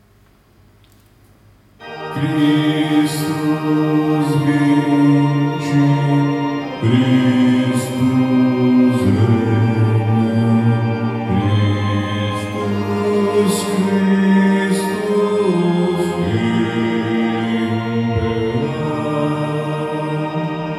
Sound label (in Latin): Christus vincit Bassi